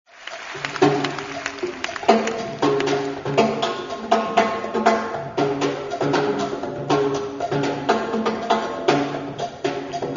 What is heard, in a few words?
Timbal